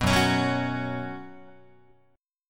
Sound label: Gb Suspended 4th Gb, down, Guitar; strummed; JGuitar